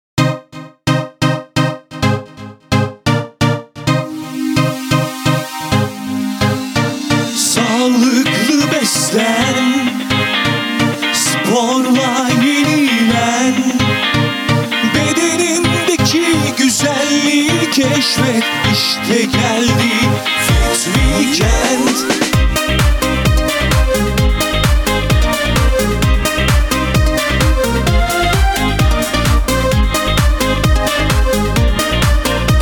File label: Jingle